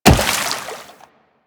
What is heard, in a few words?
Wall Jump Water; Footsteps; Warframe; Tenno; Wall Run